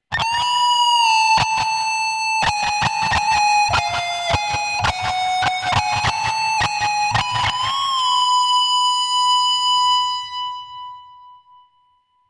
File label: backsolo